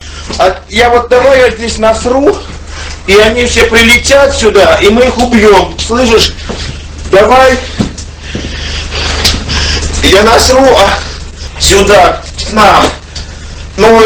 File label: fart; pvkii